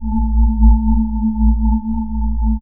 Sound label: Rotate